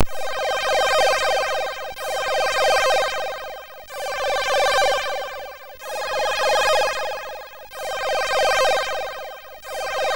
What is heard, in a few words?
Sound, MOS6581R2